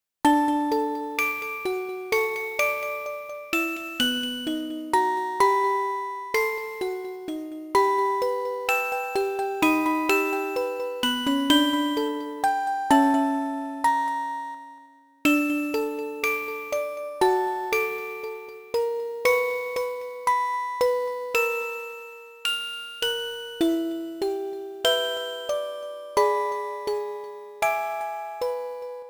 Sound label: Music Box; Pokemon Black and White 2 Super Music Collection; Twinleaf Tune